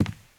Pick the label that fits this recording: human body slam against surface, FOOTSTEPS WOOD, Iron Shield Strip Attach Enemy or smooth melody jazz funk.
FOOTSTEPS WOOD